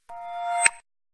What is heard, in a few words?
Crescent Notification; Samsung S21